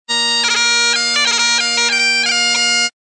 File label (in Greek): Γκάιντα